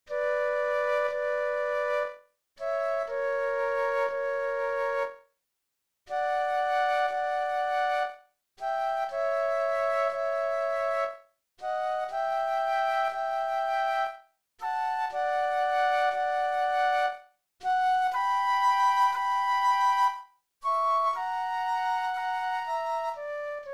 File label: Sarabande Flute Duet; classical; Handel; HWV 437